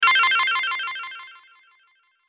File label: collectable pick up